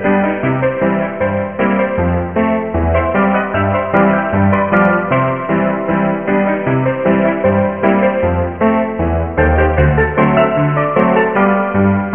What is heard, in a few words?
piano; startrek